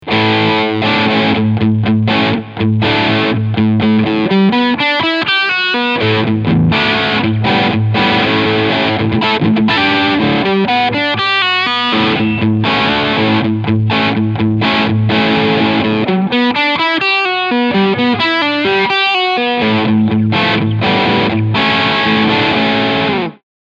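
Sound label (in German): Fender Vintera 50s Telecaster Crunchsound Steg Pickup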